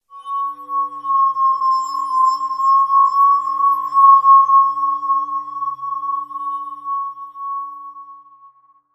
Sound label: Horror; Silent Hill Downpour